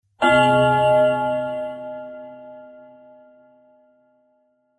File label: Naghoosh; Vocal&Effect